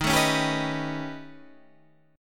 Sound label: A 9th Flat 5th D sharp, chord